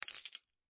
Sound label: coin; ZombieBattle